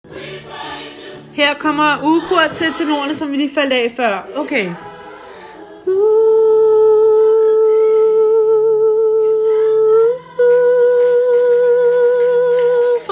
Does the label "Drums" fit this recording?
No